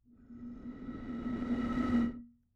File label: Bottle Blown